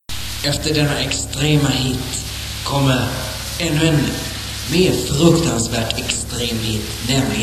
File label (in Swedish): extrem hit jingel